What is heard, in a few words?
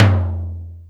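Lo Ring, Alesis D4 drum machine tom; 300 Drum Machines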